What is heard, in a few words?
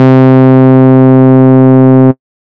SY SMOOTH; ModelCycles